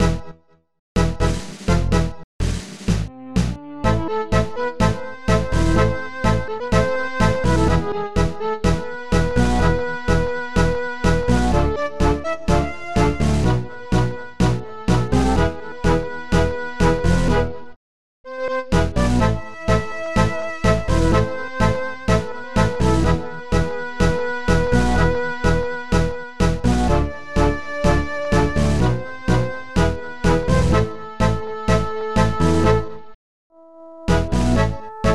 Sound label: Tango Love Song; Lizardkg